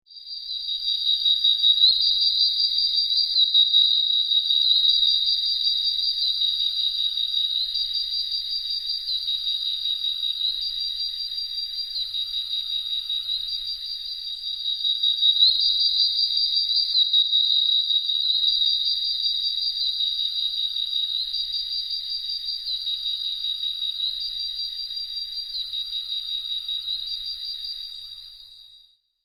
Rainforest, Tropical ambience of a rainforest.; Jungle; Nature

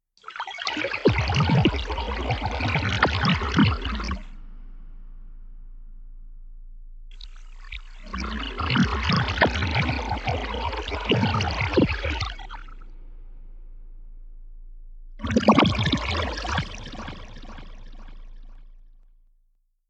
Gurgling, flowing water; Nature

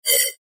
Beep Tone Signal Multimedia

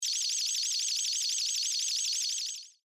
Scifi, Beeps, Flutter, Chime, Science, Fiction; Tech Sound Effects

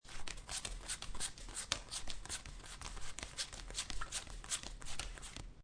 Playing Cards Dealing Many Cards In One Stack

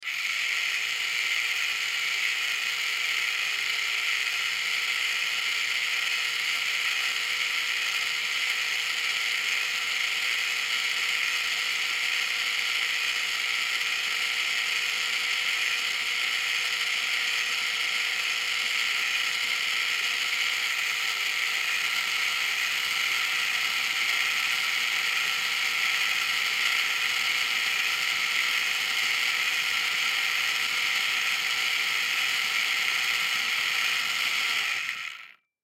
Toy Circular Saw On Run Off Long; Miscellaneous Toy; Blastwave; Foley

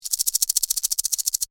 Rattle Snake, Animal, Rattle, Snake, Shake, Tail; Animals; BlastWave

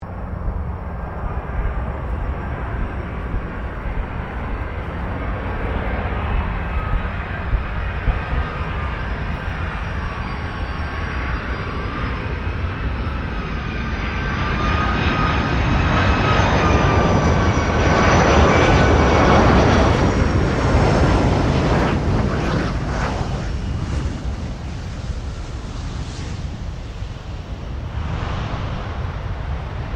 AIRCRAFT BOEING 747-400 JUMBO LANDING; 1989; Airplane; Vehicles; Aircraft Collection

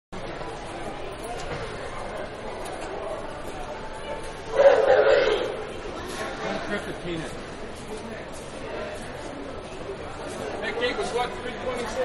Bus-Terminal-Ticket Counter Ambience; Transportation

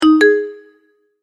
multimedia tone verified; Sound Design; McKinney Sound

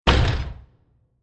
Small Bursting Impact; Bursts; Impacts; Crafted Signals

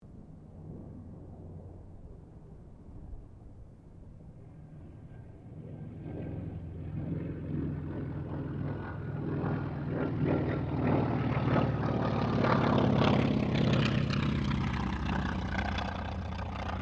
AIRCRAFT T-28D TROJAN PASS DISTANT; Airplane Sound Effects; Airplanes (Sound Effects:Vehicles)

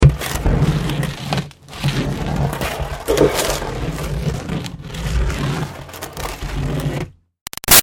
Green Garbage Pail Scrape Driveway; Plastic; Gritty; Scrapes; Objects

